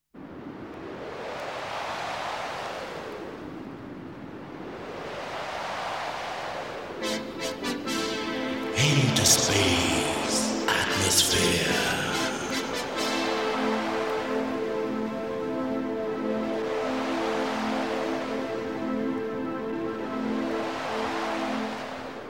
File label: Jingle